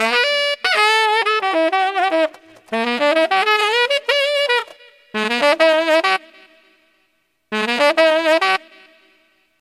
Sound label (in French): saxophone son